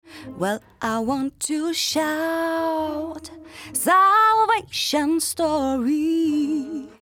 De Essing Auto De Esser, Mixing Lead Vocals

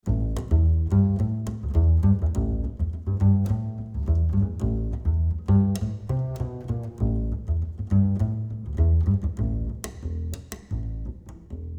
Ac Bass 50cm C Plucked High Mid

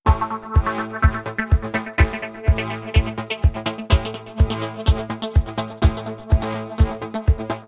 Kick Synth No Ducking

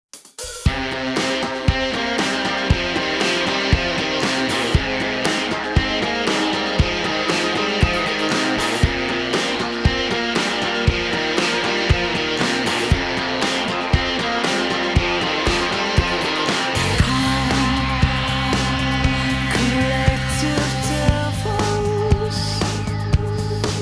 Loudness Multi Distort

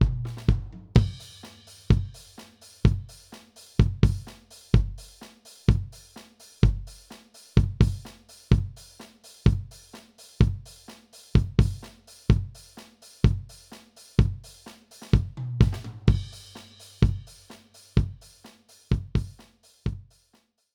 Kick No Trans Mod

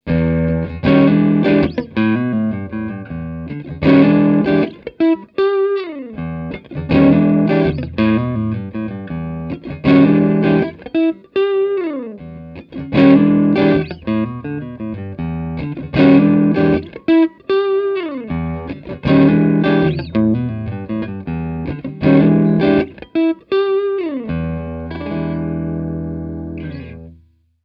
Elec Gtr Location Small Room Centre

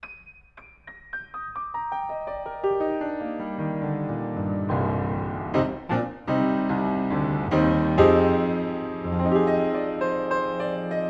Piano, Close Outside Mic

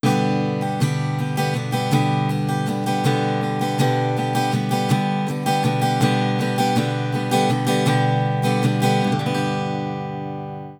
Ac Gtr No Spread

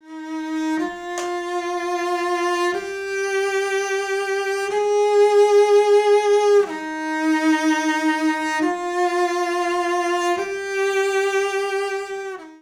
Off Axis Closer

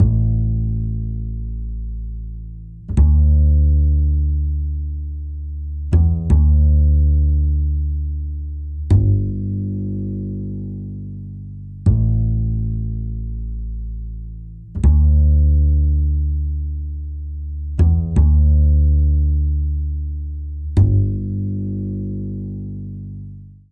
Bass No Dyn EQ

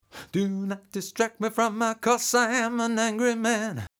Masking Vocal

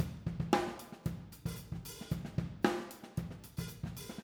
OH Polarity Fix

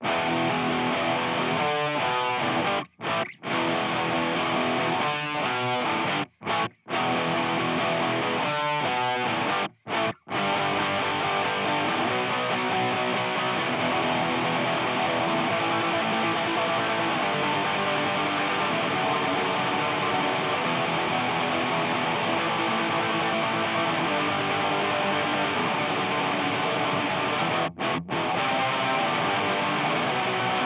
Gtr Bass Mix

